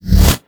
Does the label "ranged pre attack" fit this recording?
Yes